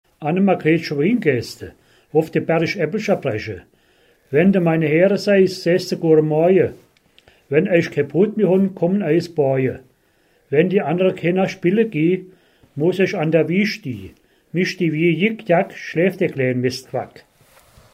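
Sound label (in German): wiegenlied, O-Ton Hunsrück; Mastershausen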